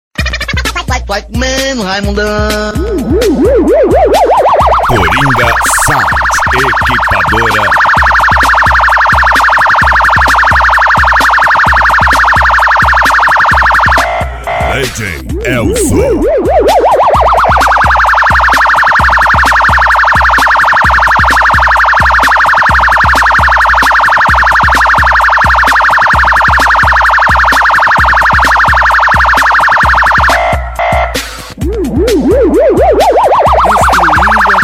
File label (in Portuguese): sirene; coringa sound funk 2019